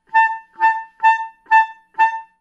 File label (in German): Glucksender Ton A5